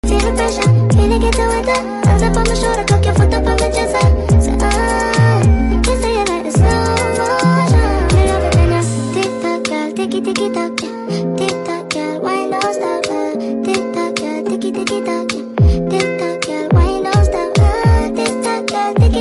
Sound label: slow motion instrumental bgm